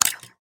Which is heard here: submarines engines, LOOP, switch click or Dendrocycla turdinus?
switch click